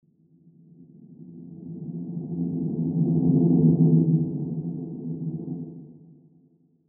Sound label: ghost presence